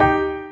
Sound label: Drop Lead; osu